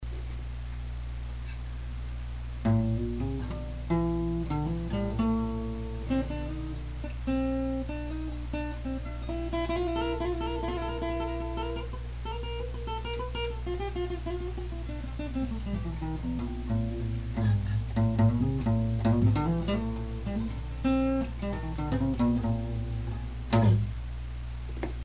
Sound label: phrygian jam; just messing around with the guitar